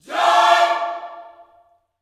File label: JOY CHORD, Gospel, Vocal; ILIO; Vocal Planet